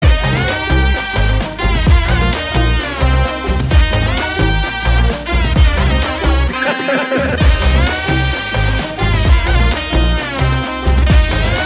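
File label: background music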